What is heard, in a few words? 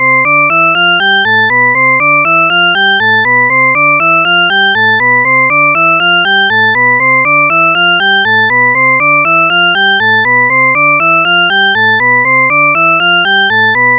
pure tone, shepard tone